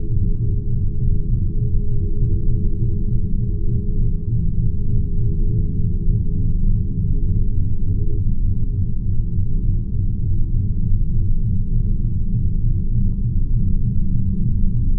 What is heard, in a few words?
ambience